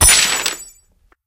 Collect Ticket; angry birds

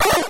eat Bird; Dino Run

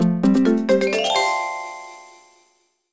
Jingle Set Complete; my baby first steps 2009

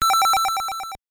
Weapon Activated; Gradius; MSX

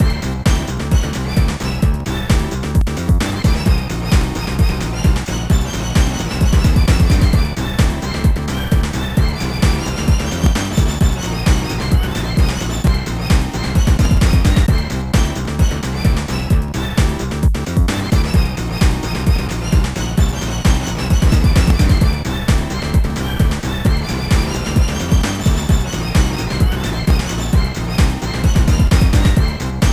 Character Ending; Dragon Ball Z Shin Butouden; Saturn

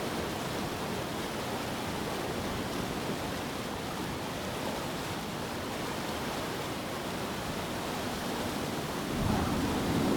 Scot Farm Mill; The 39 Steps

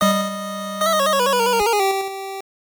Miss; Bullfight; System 1; arcade

